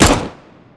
usp fire; cstrike; csgo

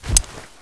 ursus flip, knife; weapons; cstrike; csgo

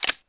glock clip in, weapons; cstrike; csgo

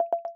affirm melodic

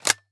galil clip in, weapons; cstrike; csgo

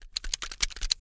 deagle special look at; weapons; cstrike; csgo

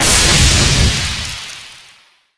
molotov extinguish; weapons; cstrike

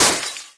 molotov gibs; weapons; cstrike

